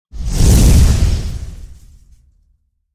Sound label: fireball incoming; mario